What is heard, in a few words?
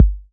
kick, drums; Playdate SDK; MIDI Player